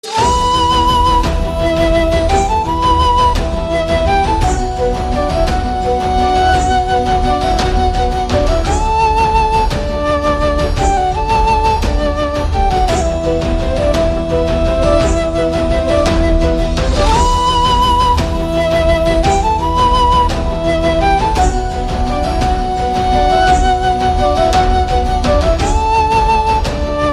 flute

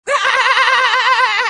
haha; bob esponja

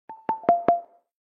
samsung galaxy s10 orion notification

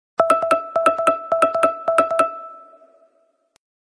nokia 9 pureview carbon

